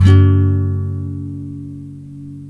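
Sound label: NYLON, G# MINOR DOWN, CHORDS; Spectrasonics; Hans Zimmer Guitars VOL-1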